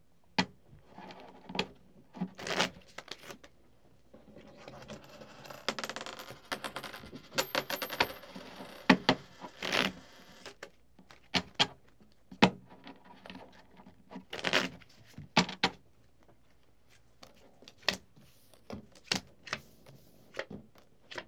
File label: Card Movement With Coins; Sports & Recreation